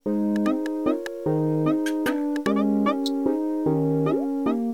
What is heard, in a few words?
Merry Go-Round; Super Mario Sunshine; Pinna Park